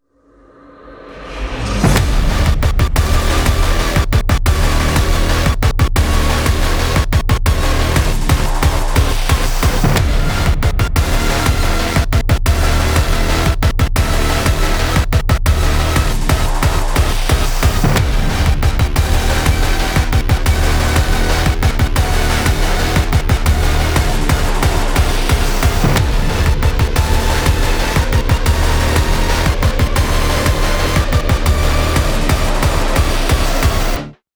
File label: Music Boss Phase3; Dlc1 Maykr City Music Boss Phase3 0